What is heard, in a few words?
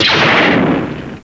LAZER; Starwars